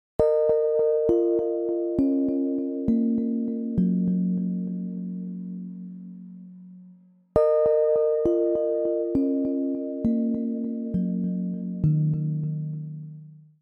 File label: Epiano Chords